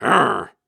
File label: Snarls and Hums, Viking Vocal